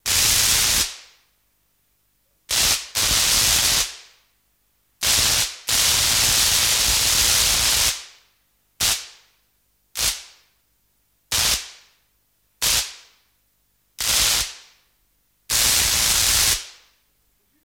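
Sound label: Electric Arc Variable, arcs sparks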